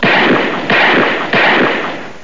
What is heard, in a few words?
Gunshots!